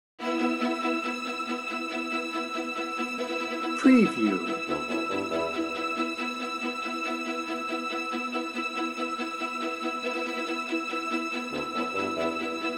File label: bed no drums